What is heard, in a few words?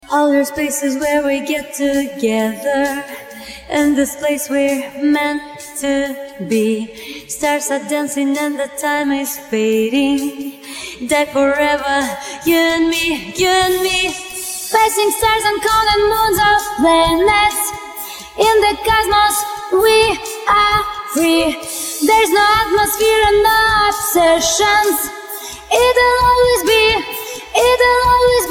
Filtered Acapella Snippet; fan made